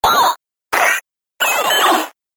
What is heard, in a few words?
Robot Droid Death Selection, Robot Sound Effects